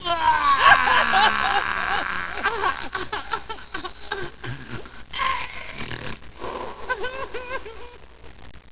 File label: cry